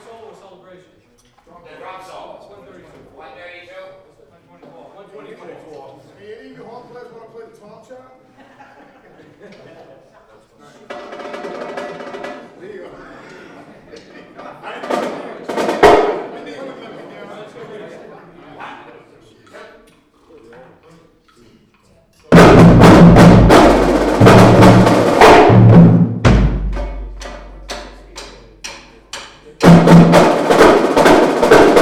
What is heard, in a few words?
Drum Solo; ensemble practice